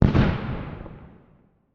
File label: Explosion Distant Mortar; Fire and Explosions